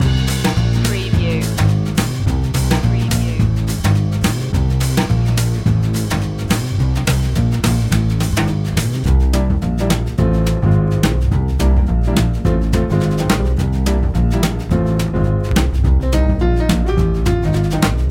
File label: magic whistle loop